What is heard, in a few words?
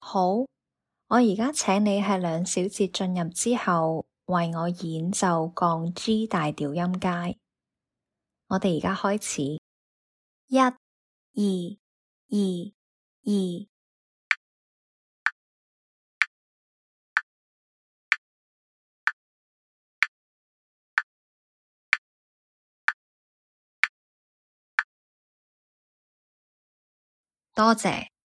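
G flat major scale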